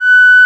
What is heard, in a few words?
FX TONE; MIX FX; E-Lab; X Static Goldmine 2; akai